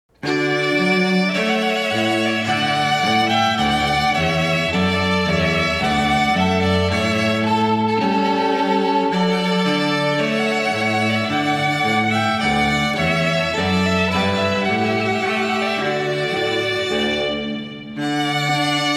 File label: Trumpet Voluntary, strings